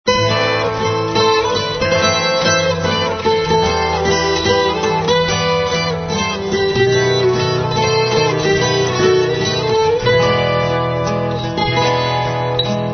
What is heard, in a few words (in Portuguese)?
viola quebrada